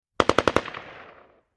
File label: Ak47 Machine Gun Burs